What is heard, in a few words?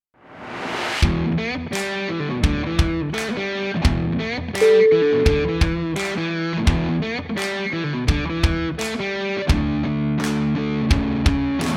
heavy and powerful